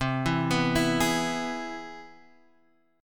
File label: C Augmented Major 7th, Guitar, Standard; arpeggiated; JGuitar